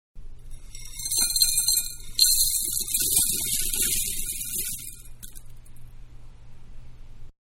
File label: Cereal-Pour Into Small Bowl-No Bag; Pouring; Manhattan Production Music